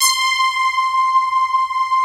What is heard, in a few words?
PADS; Pro-Rec - Synthsonic; TR PADS